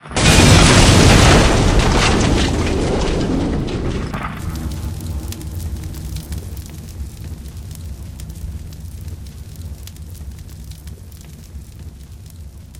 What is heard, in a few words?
heli explosion